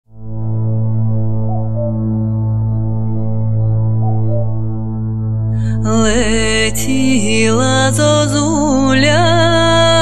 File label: Ukrainian folk song; ukrainian folk song лет ла зозуля